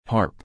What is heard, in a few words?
harp; Saint Patrick